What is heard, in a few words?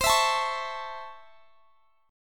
Db Minor Major 7th Sharp 5th C